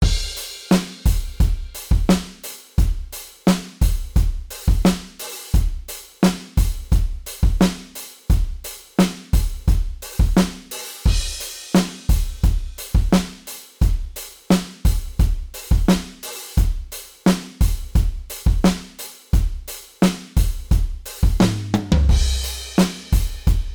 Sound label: modal surf, drums